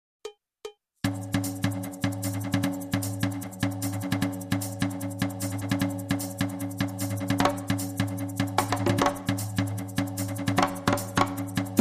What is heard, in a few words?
Conga Bongo Loop